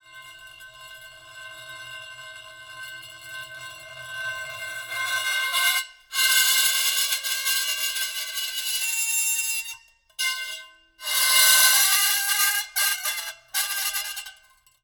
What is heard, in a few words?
Medium Long Metal Square B, Bright Harsh Squeaking, Tonal Feedback, Ringing; Double Trouble Audio; Metal Resonances